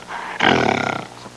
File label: gorilla sounds